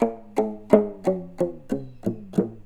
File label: PAPANG, Indian Traditions; USB Soundscan vol.45; AKAI